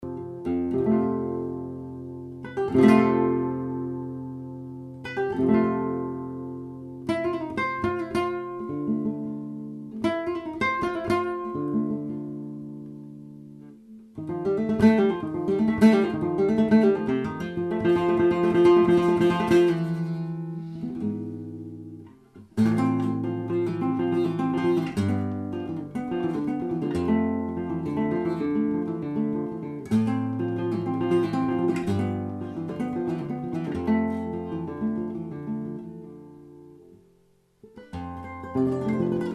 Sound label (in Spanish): rondena